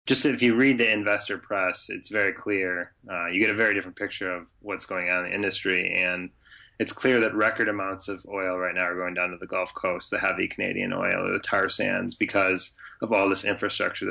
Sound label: Horn